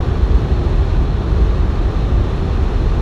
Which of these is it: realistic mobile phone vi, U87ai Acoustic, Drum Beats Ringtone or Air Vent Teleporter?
Air Vent Teleporter